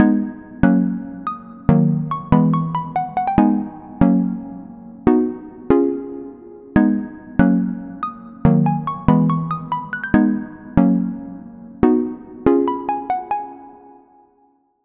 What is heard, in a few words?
Key; Routed Sound; Sylenth1 Expansion - Hyper Gamer Vol.1